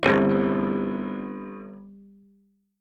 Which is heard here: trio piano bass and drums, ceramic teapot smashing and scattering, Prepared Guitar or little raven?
Prepared Guitar